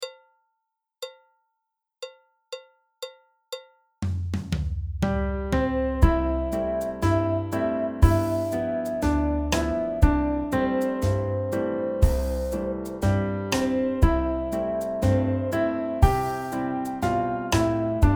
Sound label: Red River Valley Backing Track; red river valley solo